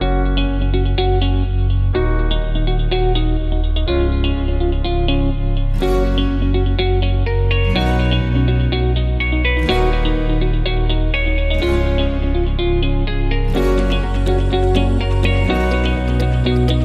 Positive Change No Drums

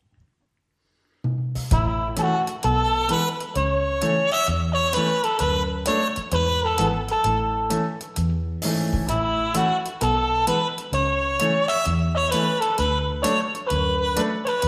Instrumental

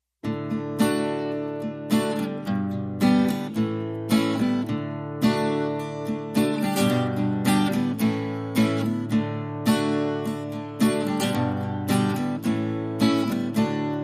Rhythm Acoustic Only

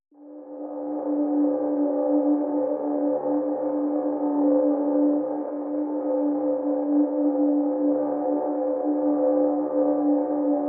Pads (D)

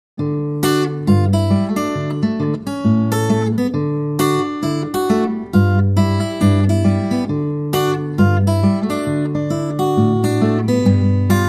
Relaxed Finger Pickin

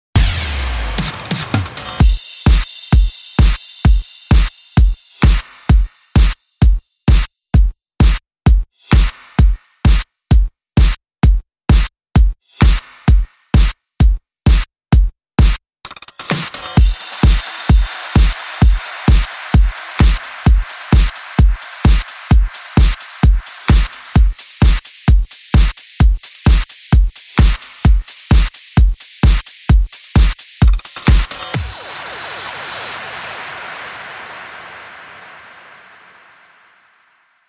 Circular Motion Beat

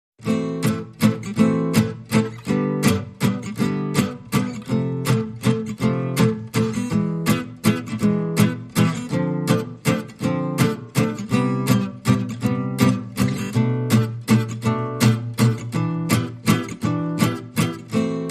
Bal Musette Waltz, No Drums No Bass No Strings

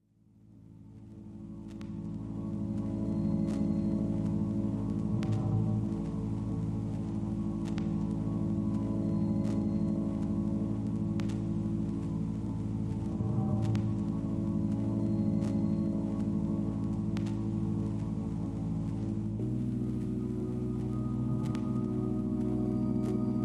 Wood, Drones Only

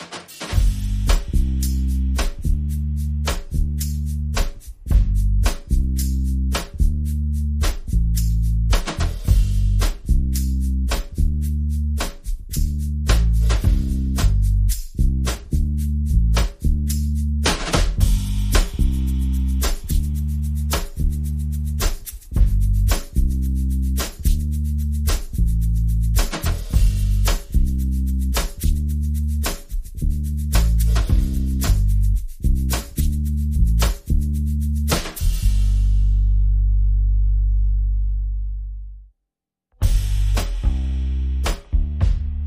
Drums and Bass Only

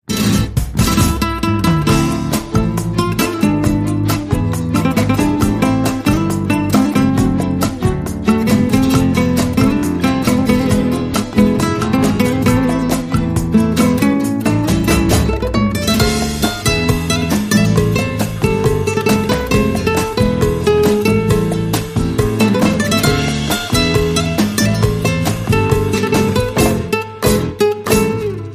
Guitarra

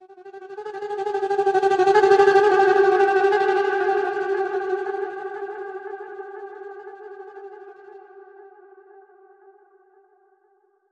Journeys Time Melody

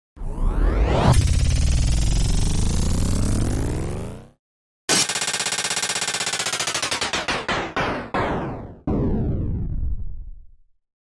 Speed Up Slow Down

